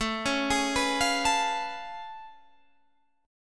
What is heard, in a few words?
A Augmented Major 9th A down